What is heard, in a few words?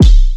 kick splasher, Hip-Hop; Drum One Shots; SD CAICOS Hip-Hop Sample Pack; Slate